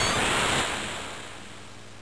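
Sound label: spin attack; Scratch; Sonic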